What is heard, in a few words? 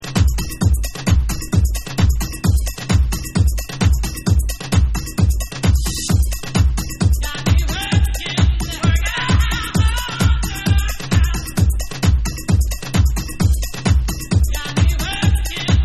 WURKIN Tribal Tool Mix